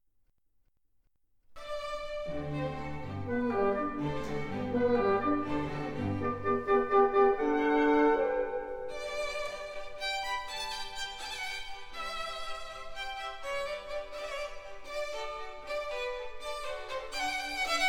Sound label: Violin Con G